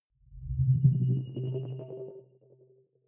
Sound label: sci-fi display transmission modulated